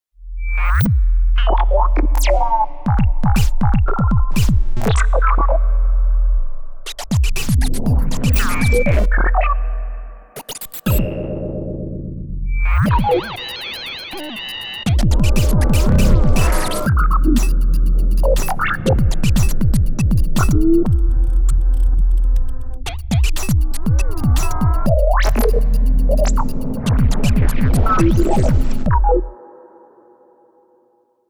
Arp2600; arturia

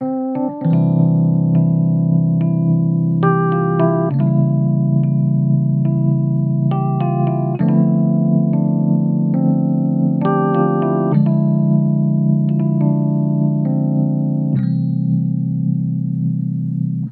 Smooth EP

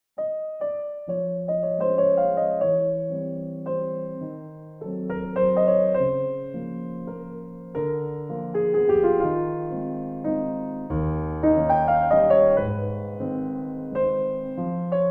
Waltz; Chopin

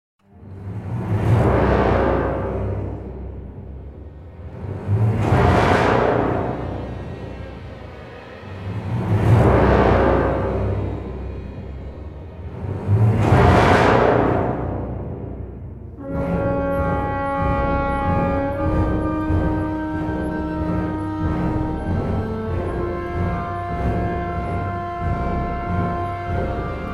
Tutti, Prehistory

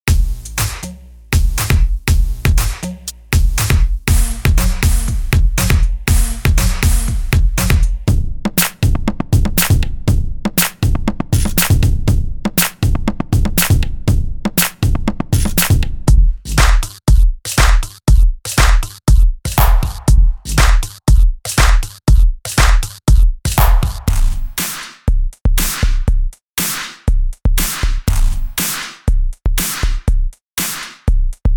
120BPM Kit Medley

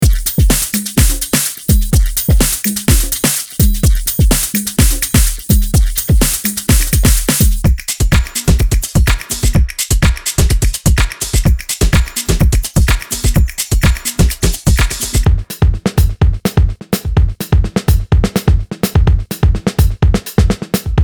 Broken Beat Kits; maschine expansions; helios ray

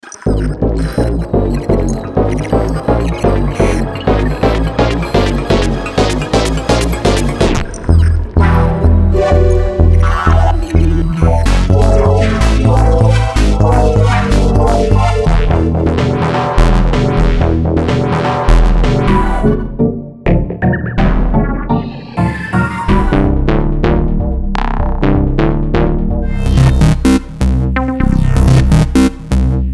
Pitched medley no drums; maschine expansions; pulswerk

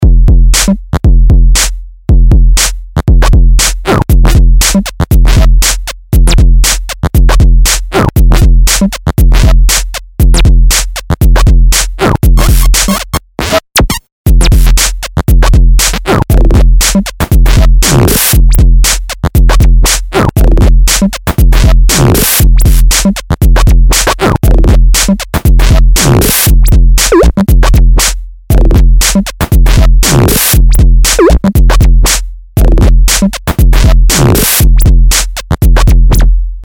synthi; Soniccouture

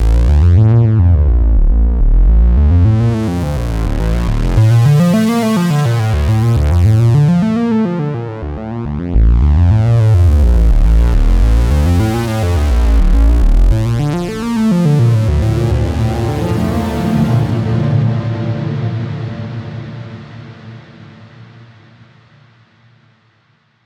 Arp Mystery

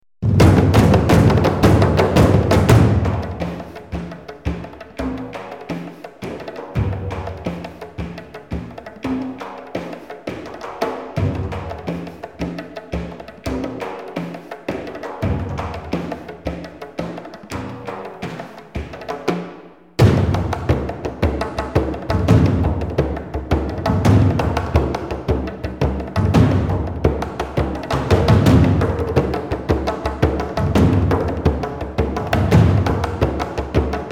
Framedrum; Strezov

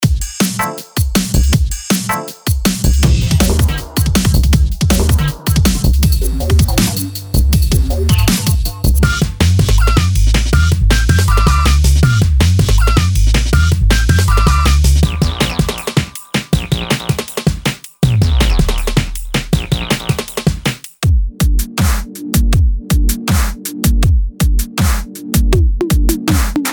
Dnb Dubstep Kits Medley; MASCHINE 2.0

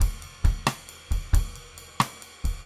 Blues; The Loop Loft

